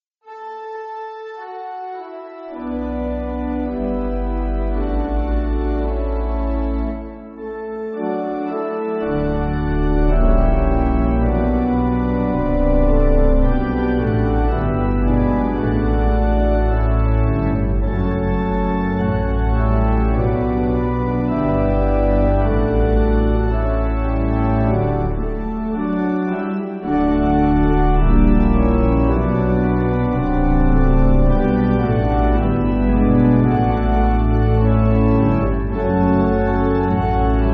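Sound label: Pipe Organ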